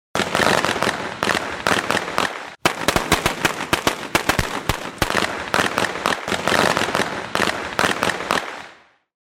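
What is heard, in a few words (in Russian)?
праздник; Звук петард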